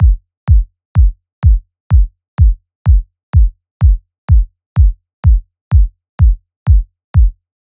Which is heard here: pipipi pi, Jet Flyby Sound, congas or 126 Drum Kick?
126 Drum Kick